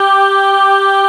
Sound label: CHORUS G4; CMP - Analogon; TREMOLSKI